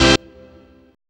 dance chord

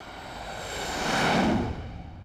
Airport, FX Effect Sounds

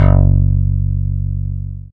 Synth Slap, baesse

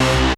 dance chord

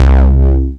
Synth E-Bass II

